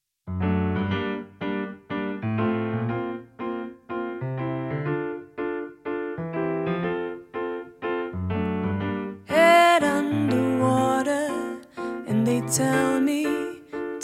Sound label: love song, singer pianist